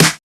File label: Snare; FLPRO